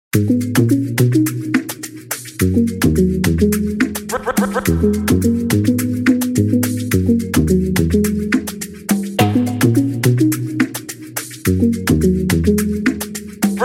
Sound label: Instrumental